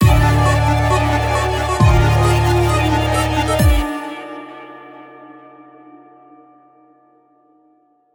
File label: Intro Mineur; Onirism Unofficial OST